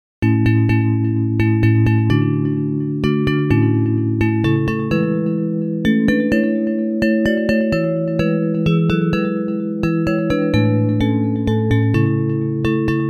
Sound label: Who Is He in Yonder Stall Bells